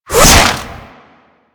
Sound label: Infested Whip Whoosh